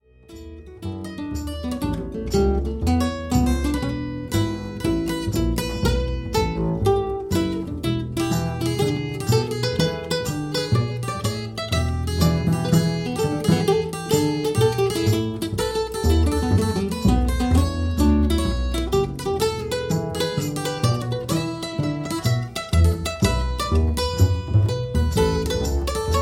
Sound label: Trio Blues